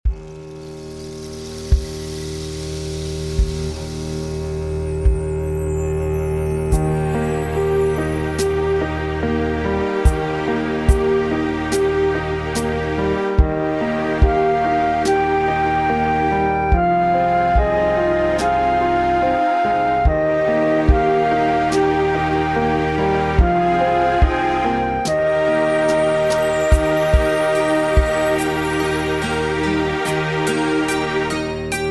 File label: Suo Gan E Flat